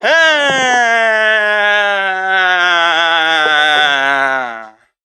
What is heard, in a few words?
"ha" LONG; Voice; ooh ahh